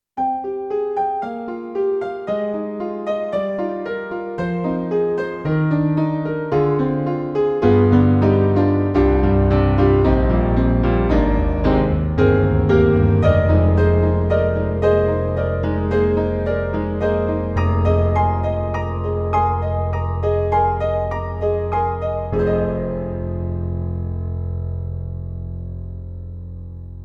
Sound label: Acoustic Piano Dark Grand; Alesis NanoPiano